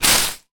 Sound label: foley dried leaves movement foot scrape; zapsplat